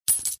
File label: coins; toy story; sarge